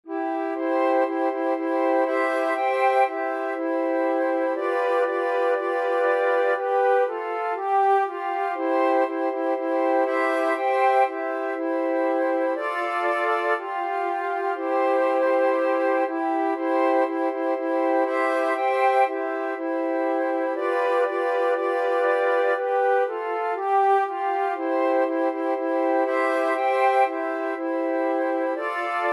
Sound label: flute quartet